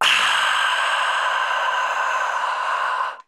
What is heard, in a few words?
"ah" exhale